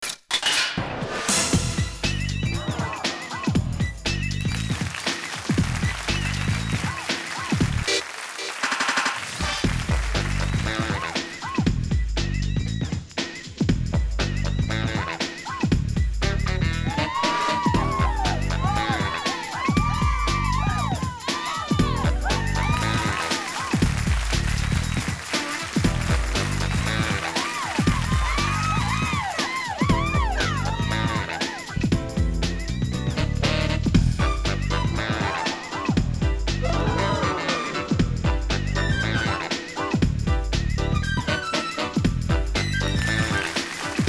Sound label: Hip-hop; Mall Tycoon 2